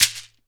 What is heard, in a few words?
SHEKERE, Synclavier Percussion; ILIO